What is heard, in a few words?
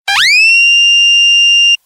Loud Sms